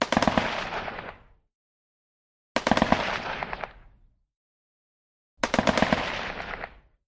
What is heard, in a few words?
AK47 Various Burst Sl